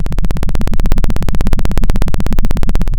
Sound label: pop, Sound of a helicopter